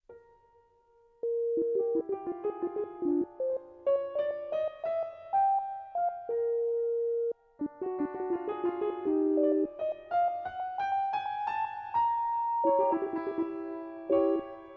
Sync Sine Soni

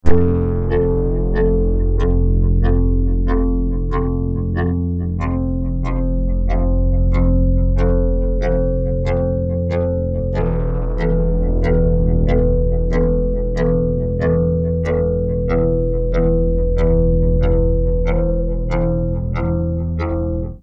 Synth Poly

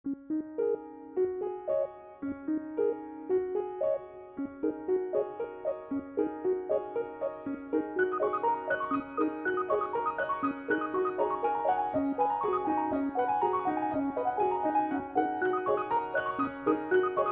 Sync Sine Soni

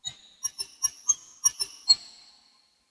Glockenspiel

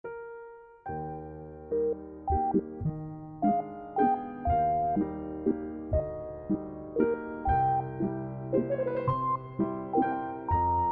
Sync Sine Soni

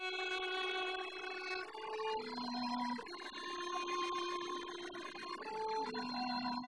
Castanets Violin Applause

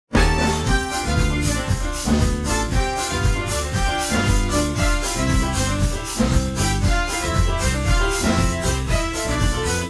Jazz; TSMtoolbox

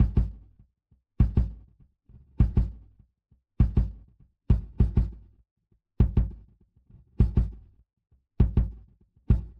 WaveDrum, KD; Decomp Mag Zero Phase TR

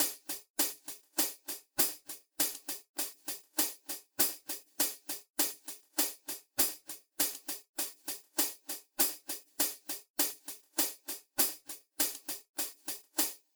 Wave Drum SEP HH

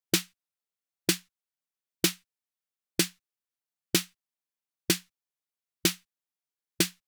Techno Drum SD

